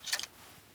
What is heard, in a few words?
sawnoff shotgun insert shell; Rust